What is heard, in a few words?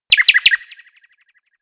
birds